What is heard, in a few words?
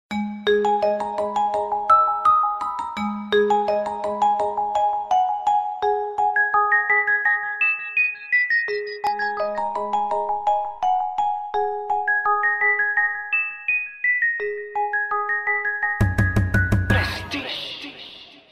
Bells